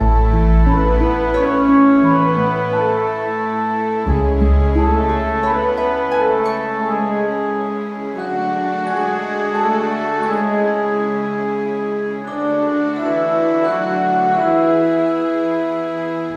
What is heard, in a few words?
Library Orchestral; Audio Animals